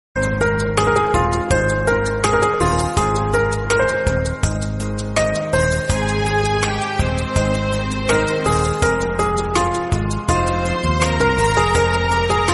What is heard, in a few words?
movie hindi romantic ringtone; maine pyar kiya movie